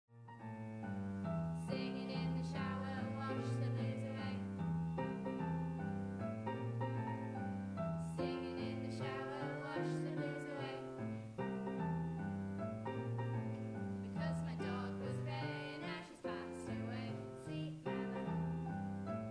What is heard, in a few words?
blues performance